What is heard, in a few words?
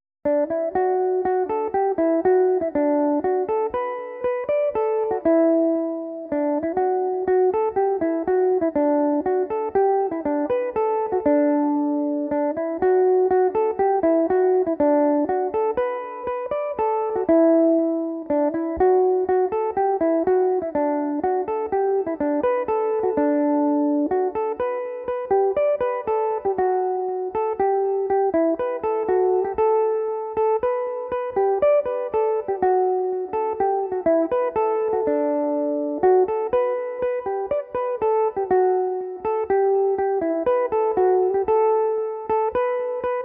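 arklow half arch D major 3/4 march, irish mandolin; Belmuse EM-190 electric mandolin; The Arklow Half-Arch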